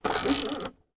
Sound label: van cab temperature control dial move mercedes sprinter